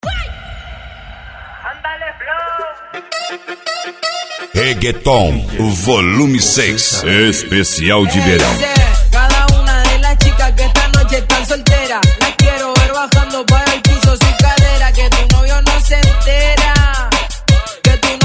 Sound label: Reggaeton; Argentina